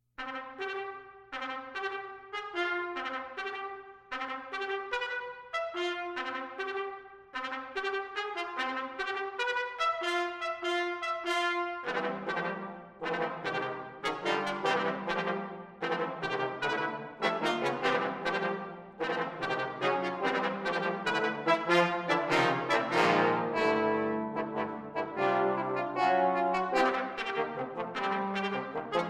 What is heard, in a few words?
Music For Brass Octet, Allegro Vivace